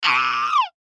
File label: Human Scream; Scream Short Male Seven Yrs Boy; Screaming